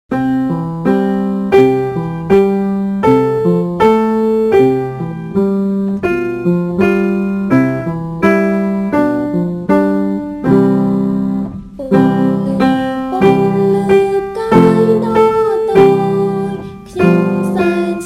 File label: Twinkle Twinkle Little Star; បទចំរៀង-ពន្លឺ-ផ្កាយតូច